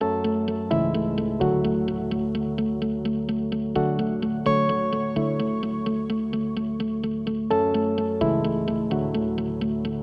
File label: home bgm; sound blast